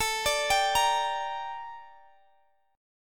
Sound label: G Minor A, chord; Gm/A arpeggiated